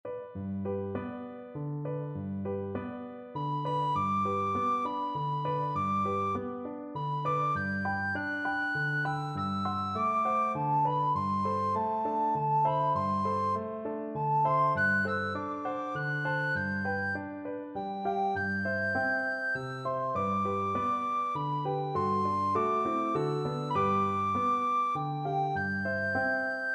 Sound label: lullaby, recorder; brahms